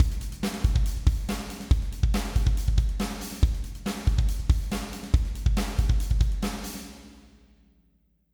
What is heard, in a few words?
STUDIO DRUMMER; KONTAKT LIBRARY